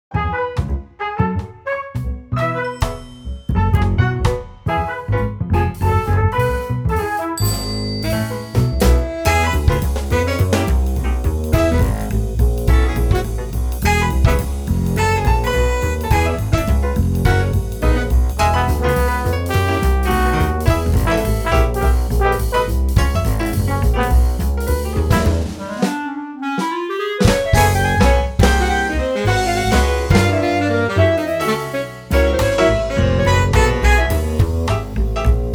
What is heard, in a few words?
Jazz; Jazz Corner 2